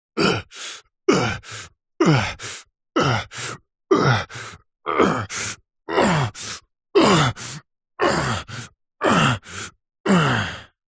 human male voice groan work out arm curls; zapsplat

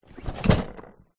household fabric drawer close storage tidy

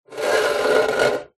metal box small empty slide scrape concrete; zapsplat; foley

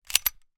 gun 1911; weapon; still north media

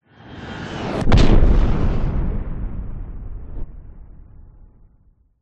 airplane jet crash explode designed; vehicles

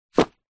household rubber glove drop on carpet

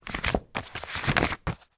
household small address book pages turn

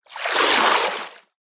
nature water movement surface; zapsplat

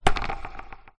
drone dji phantom 3 prop spin push finger; transport

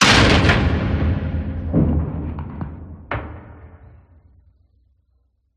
Metal Impact Crash Hit; PMSFX

